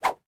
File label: Shellgame